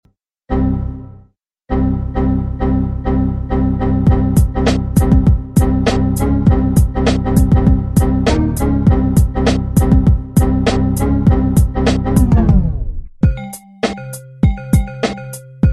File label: windows music